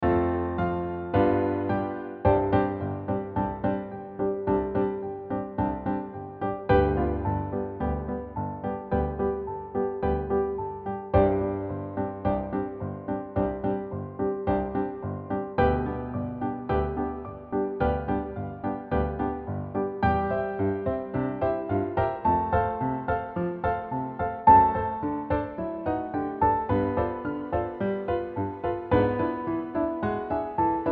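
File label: Kids accompaniment ONLY; THE SOUND OF MUSIC; PAPER MILL PLAYHOUSE; accompaniment to sing along with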